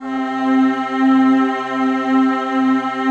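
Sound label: PAD, atmospheric pads; Best Service; XX Large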